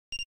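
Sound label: autofocus success; LG VELVET 2 Pro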